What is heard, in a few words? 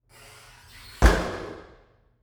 Foley Door Stall Door Closing In Public Restroom